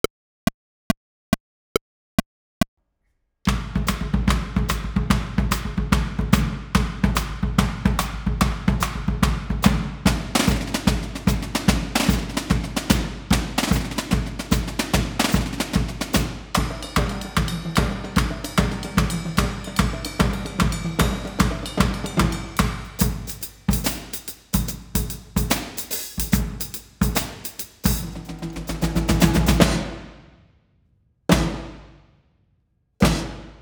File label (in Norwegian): Trommesolo dans